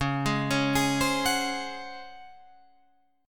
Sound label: Db Major 7th Suspended 4th Db down Guitar Open F; arpeggiated